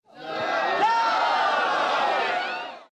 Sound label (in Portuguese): gente noo